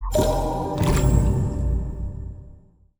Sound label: ui dice bad